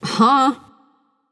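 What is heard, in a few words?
confused, female; smite; voicepack; hera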